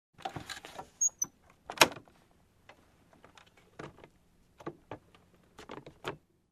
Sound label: Aircraft bin placing luggage sequence